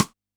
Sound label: rim, Yamaha DD-65